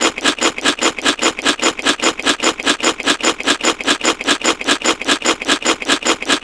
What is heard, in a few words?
eating